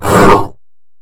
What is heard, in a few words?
sleep pain; sleeper